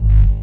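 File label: Ambience Sci-fi Energy Loop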